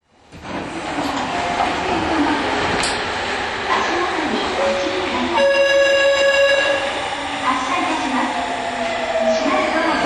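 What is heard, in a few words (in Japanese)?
走行音, 50050系; Tobu; 水天宮前→清澄白河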